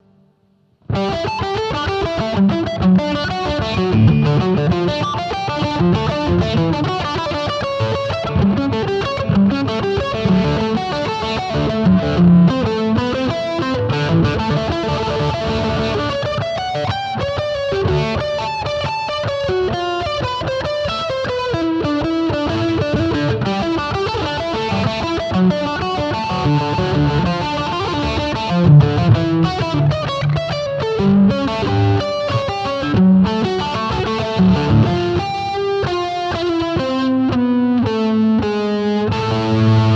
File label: bouzouki